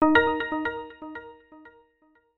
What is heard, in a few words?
multimedia alert system